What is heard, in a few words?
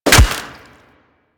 Melee Staff Impact Flesh